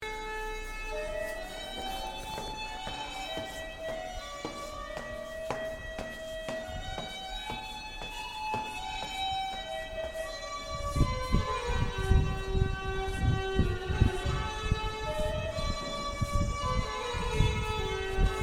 busker playing exotic string instrument to an empty station; lohsestrasse cologne germany